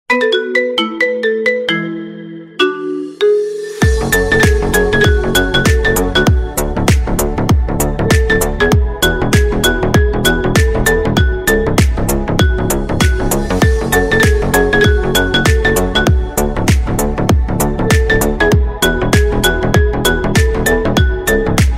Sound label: iphone remix; iPhone Tarzı Zil Sesi